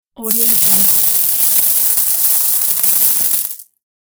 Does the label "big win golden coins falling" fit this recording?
Yes